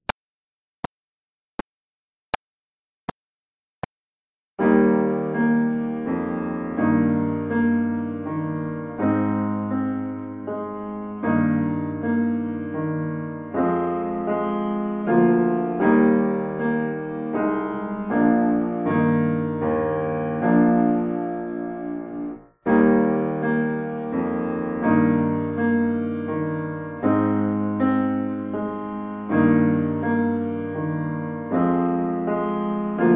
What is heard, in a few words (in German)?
Menuett Playalong, Trompete